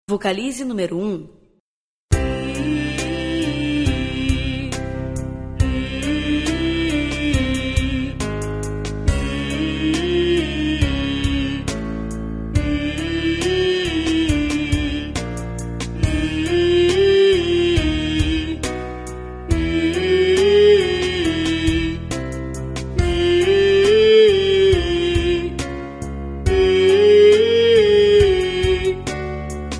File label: vocalize